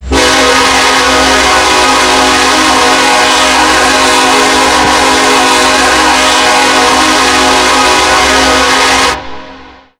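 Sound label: k5lla, horn; chris c run8 v2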